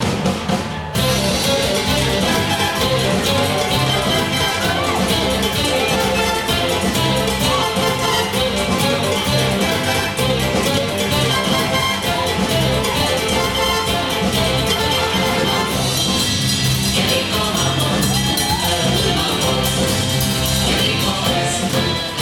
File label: Mambo